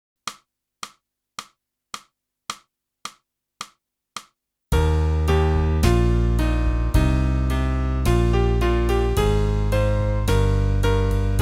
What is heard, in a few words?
clarinet; boosey method